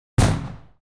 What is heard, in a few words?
Fireworks, Thunderflash Bang; BBC Sound Effects Library; Explosions Guns Alarms